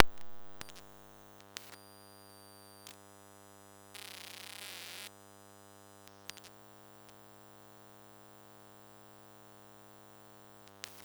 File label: Old Cable TV Box Activity; Sci-Fi Interface; Sonic Bat